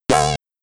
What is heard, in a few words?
Coin; Hammerin Harry; arcade